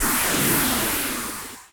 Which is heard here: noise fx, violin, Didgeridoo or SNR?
noise fx